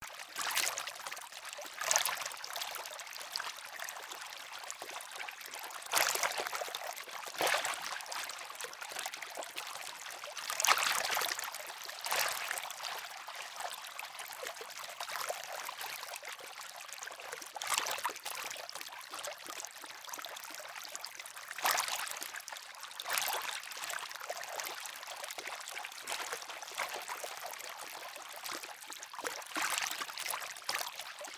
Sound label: Fish Swim